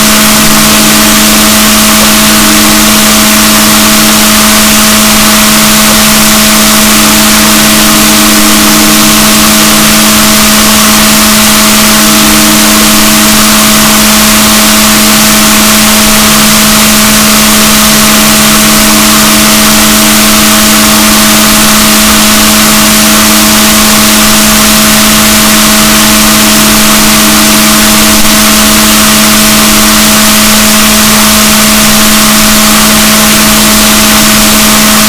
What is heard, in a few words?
rorquals negatif, gaussian noise, BalaenopteraPhysalus Chile